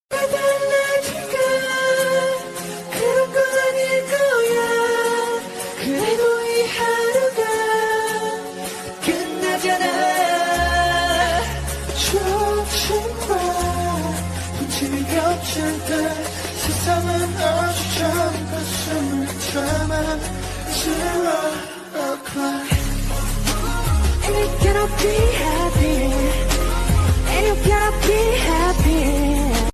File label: korean ringtone